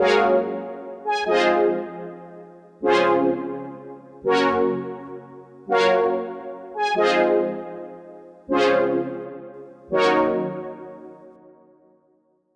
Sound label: Juno Synth; WA Glow